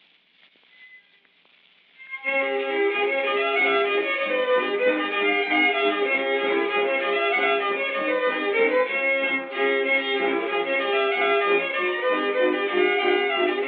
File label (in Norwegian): MUSIKK; amerikanorsk; einar haugen